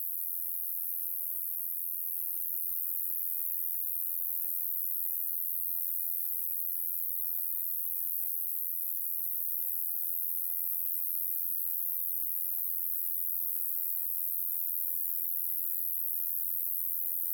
single cricket night countryside italy; Sonniss GDC

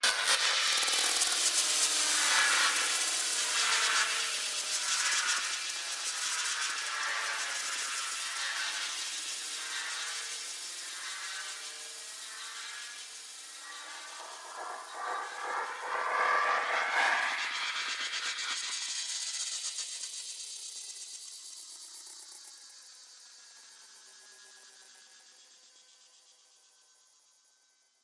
tension sizzle drips; sonniss gdc

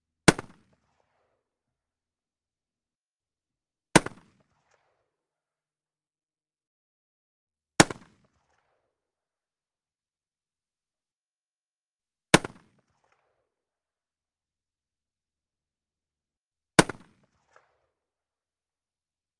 k98k firing; mkh416; Sonniss GDC

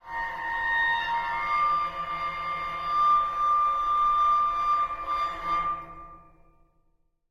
sound sculpture spring; sonniss gdc

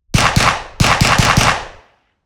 glock 18 75m behind clean six shots; ehrlund r2; sonniss gdc

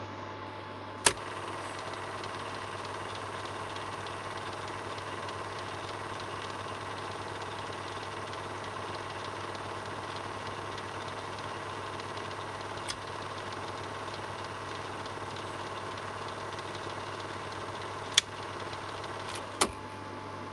projector antique run; sonniss gdc

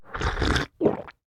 consumable consume drink sip polite; food and drink; consumables

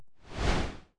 ranged magic energy strands; 15ft; psfx

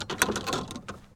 sports golfing golf bag remove club; sonniss gdc

